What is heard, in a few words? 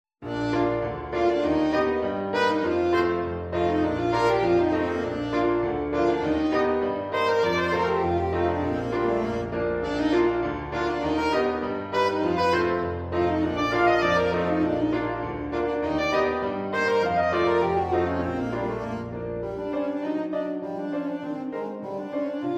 SAXOPHONE